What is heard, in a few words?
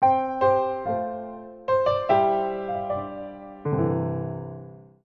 Introspective Piano Etude